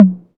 studio tom drum f sharp key, tom drum tuned to the F# note. Loudest frequency: 235Hz; tom drum samples; one shots